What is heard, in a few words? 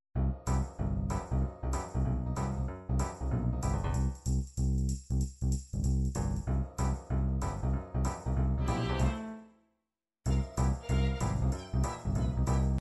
detroit pop, midi